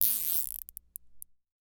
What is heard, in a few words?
Cable Tie; Foley